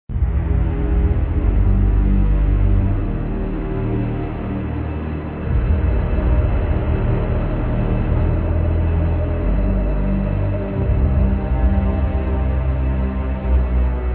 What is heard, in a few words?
Horror